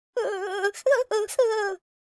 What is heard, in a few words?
scared, Hippy